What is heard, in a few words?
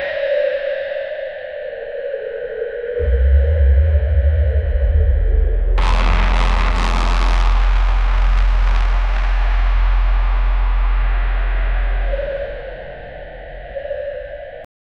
dangerous earth quicks, earth